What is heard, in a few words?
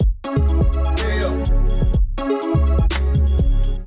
kit groove 124 bpm; MIDI Clips; Equinox Kit; Ableton Factory Packs; Beat Tools